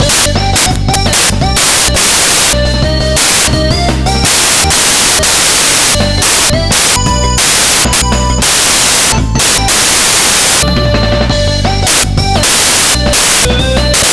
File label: pop, bgm; pictcode